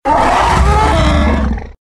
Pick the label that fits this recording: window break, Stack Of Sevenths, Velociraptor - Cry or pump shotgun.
Velociraptor - Cry